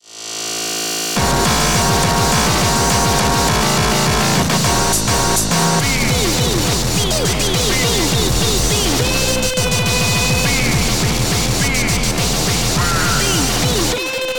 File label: Breakcore